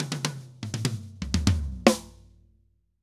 Drums Poly 120, Drums & Percussion; Best Service; Festa Latina VOL-1

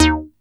MC 202; Masterbits; Vintage Voltage; SEQ-FX

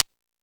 Timbale, E-MU Pro-Cussion

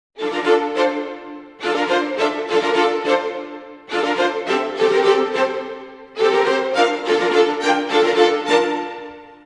105 strings, Orchestral arrangements, 105 D min

